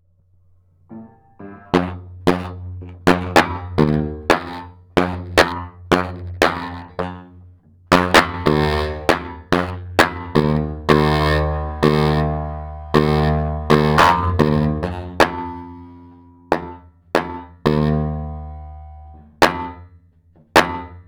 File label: piano